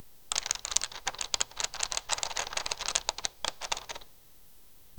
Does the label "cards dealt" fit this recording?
Yes